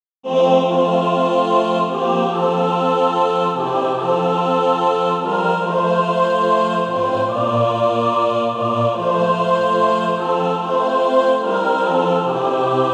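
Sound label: mixed, Practise Music; From Choirs Redeemed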